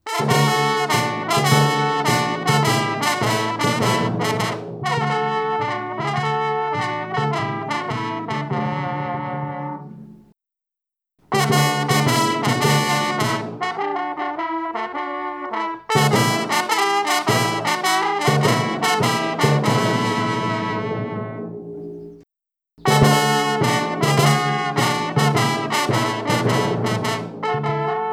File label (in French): sonate